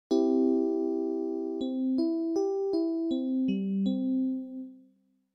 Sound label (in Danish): skala c